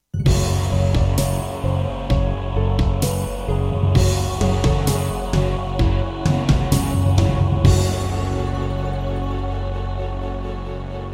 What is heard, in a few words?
Roland SD-50 GM2 MODE; Doom (Sigil); Introduction